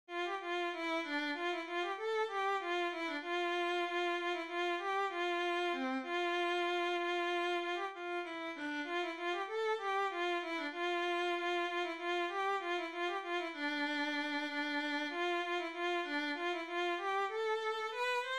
Estampie violin

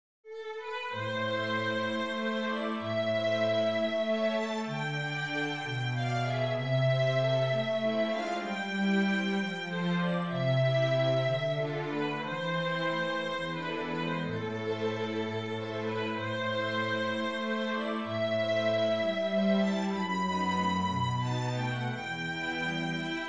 barcarolla full

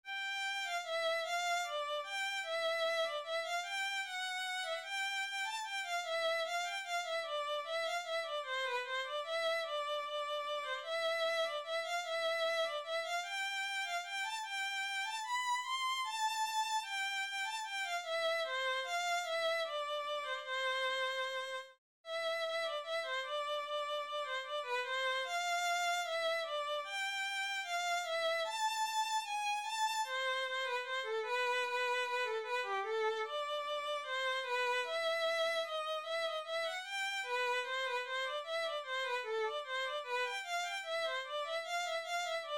Kyrie Violin

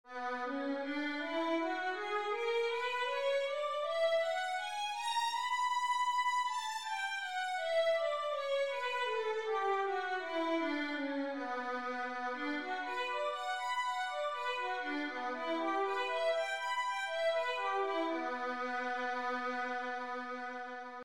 violin b minor, scales 2 octave

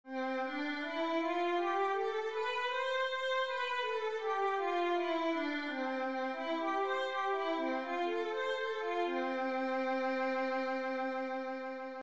violin c major, scales 1 octave

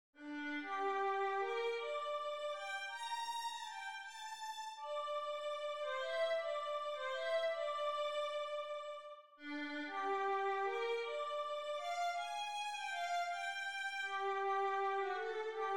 sicilienne violin

